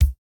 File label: kick, house